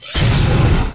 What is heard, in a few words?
Dark Pink Death; Icebreaker